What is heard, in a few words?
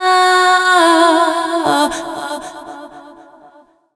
FEMALE, Dance Vocals